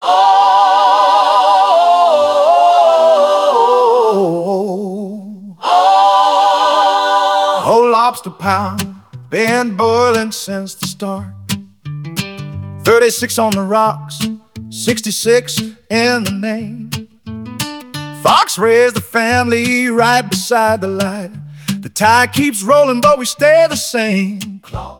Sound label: ringtone; fox s lobster house